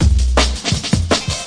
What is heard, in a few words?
162 bpm hip hop horn